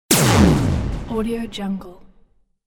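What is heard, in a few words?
laser shot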